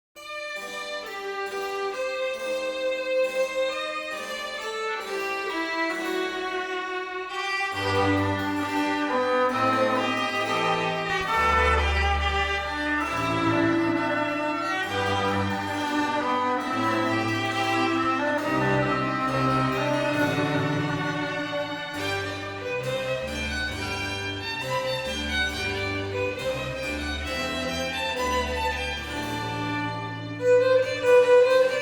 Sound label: String Orchestra cover